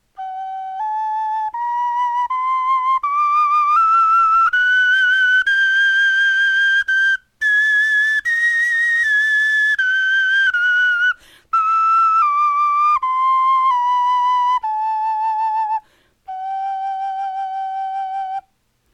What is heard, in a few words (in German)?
tonleiter, knochenfloeten